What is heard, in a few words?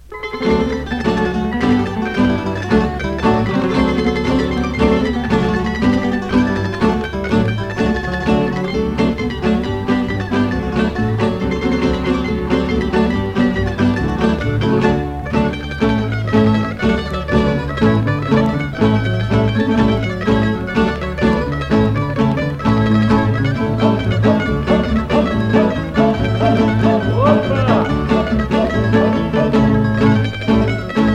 Serbia Divna, Divna Kolo, Folk Dances; Petaluma; 2nd String Folk Dances; Serbian